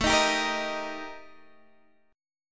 Eb Major A; strummed; Eb/A

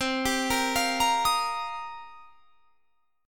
C Minor 11th C down; Cm11 arpeggiated

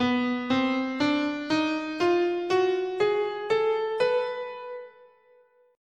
B Diminished Half Whole, scale

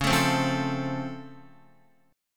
Db Augmented Major 7th, down, Guitar

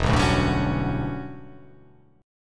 E Minor 13th chord

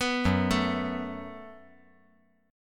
Gb Minor Double Flat 5th

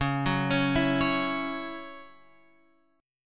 C sharp Minor Double Flat 5th C sharp, chord; C#mbb5 Chord {9 9 11 9 X 9} arpeggiated

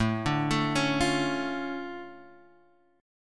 D Minor Major 7th, A, guitar

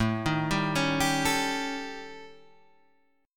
Eb 7th Flat 5th A, guitar

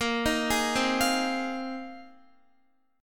A sharp 7th Suspended 2nd Suspended 4th, Guitar; arpeggiated; chordshape; x,13,13,13,12,12

